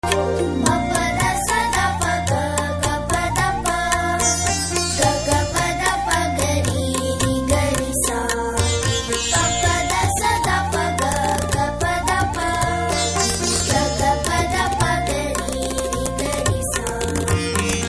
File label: BGM Humming